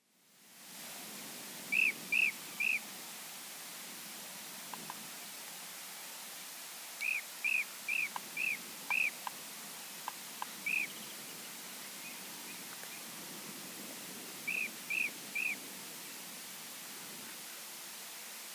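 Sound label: blue-cheeked bee-eater voice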